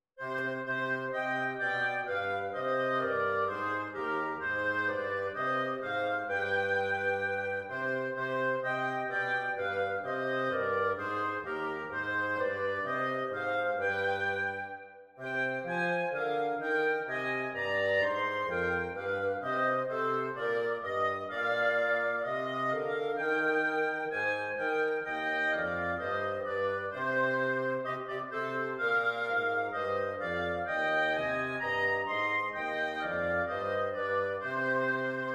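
God Rest You Merry Gentlemen oboe clarinet bassoon; Christmas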